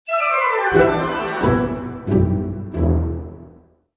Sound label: lose; merge-dragons-2